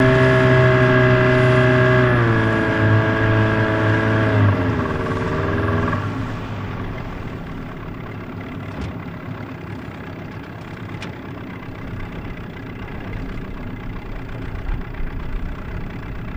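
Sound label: Motorboat Drives Slow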